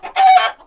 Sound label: pain, chicken